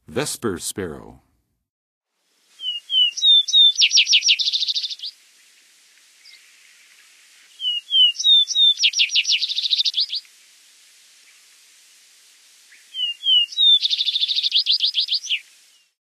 Vesper Sparrow, Songs of Birds of North America; Western USA; Western USA Songbirds

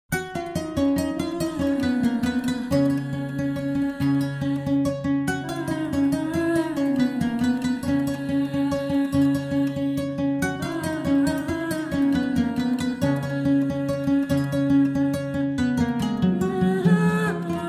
Desert Blues World; World Music

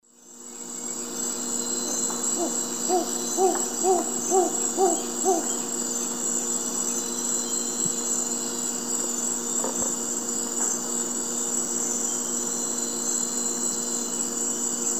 Ciccaba virgata, song; S. America; Eastern Lowlands

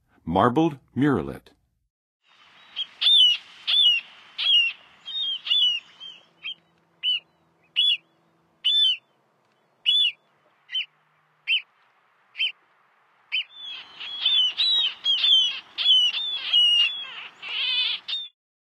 Marbled Murrelet; North America; Western USA; Western USA Songbirds

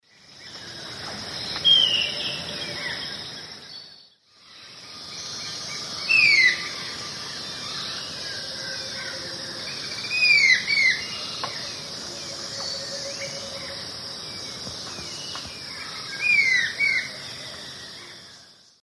Xiphorhynchus ocellatus, calls pair; S. America; Eastern Lowlands

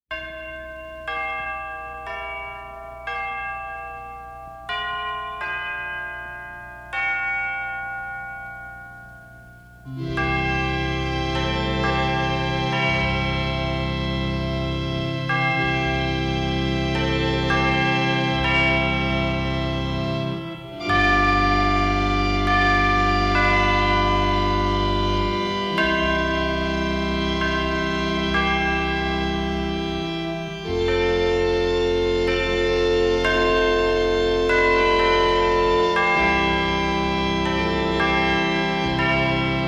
Christmas Organ & Chimes; Silent Night

